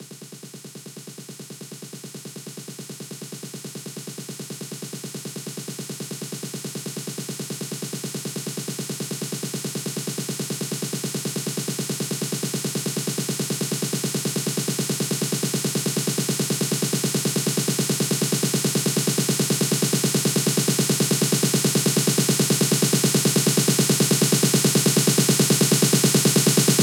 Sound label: 140BPM SNARE 16 Bars; Terminator; Vengeance Samples; VUF1 Snares&FX Pairs 140BPM